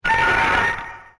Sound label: Kabutogirah roar